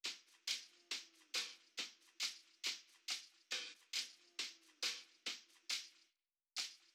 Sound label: Drum Loop, 138 BPM Hihat; Loop Stems; Cymatics; Vibes II Drum Loop Collection; Rings